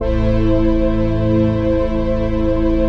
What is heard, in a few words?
PAD, Dance Mega Synth